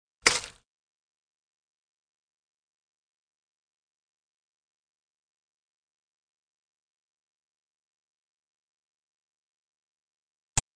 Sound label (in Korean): Fights, 싸움-전투; 헐리웃특수효과음